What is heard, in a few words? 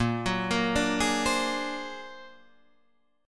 Bb Augmented 9th Bb, Guitar Open D